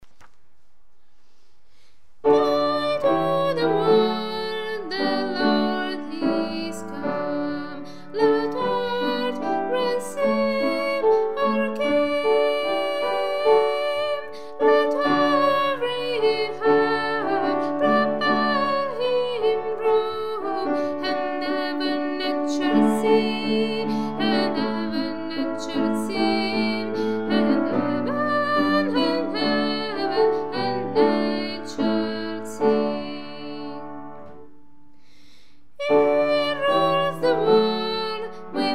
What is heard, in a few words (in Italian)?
joy soprani